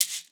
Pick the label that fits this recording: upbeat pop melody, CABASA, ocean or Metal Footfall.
CABASA